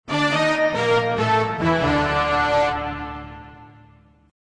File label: Music Mission fail; Ty the Tasmanian Tiger 2 Bush Rescue; PS2